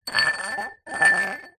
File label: household glass coffee cup thick empty roll tiled floor; zapsplat